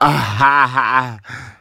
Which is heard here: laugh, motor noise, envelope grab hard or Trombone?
laugh